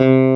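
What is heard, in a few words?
Clavinet soft C2; USB Soundscan vol.09; AKAI; Keyboards Old School; CLAVINET1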